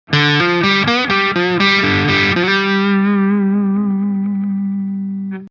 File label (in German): G Mollpentatonik mit Leersaiten